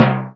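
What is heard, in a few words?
R50 Timpani, Kawai R50e Drums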